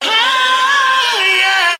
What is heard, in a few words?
"oh yeah" SUNG